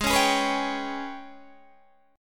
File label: G sharp Minor Major 7th Double Flat 5th, Guitar DADGAD